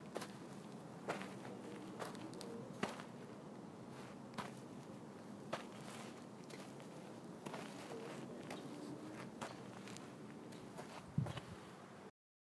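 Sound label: Footsteps On Sand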